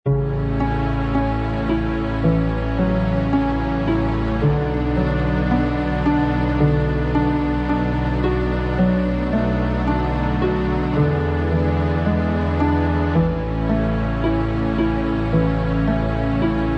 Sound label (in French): ORCHESTRE CLASS